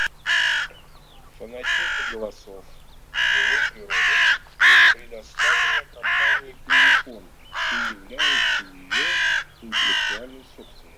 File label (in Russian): Черная Серая ворона Cornacchia